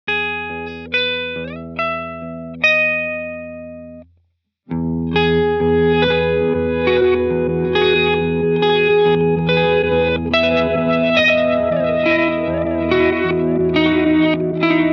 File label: Reverse Reverse Delay